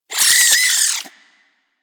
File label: vocal death, critters; Warframe; Hunting Critters; Nexifera